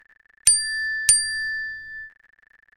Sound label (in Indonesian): nada notifikasi kaca iPhone